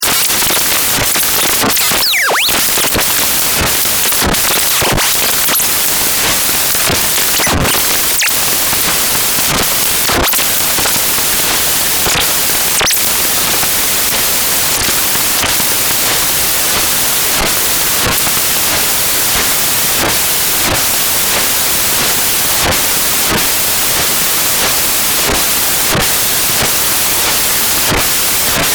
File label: Synthetic Tuning, Wildly pitching, tuning in, digital noises and sweeps.